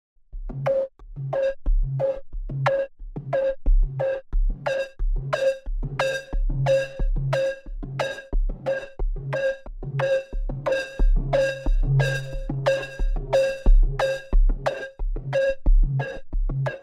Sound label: Kaox Arp